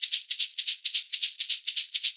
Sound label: 110 SHAKERS, Percussion Loops; USB Soundscan vol.36; AKAI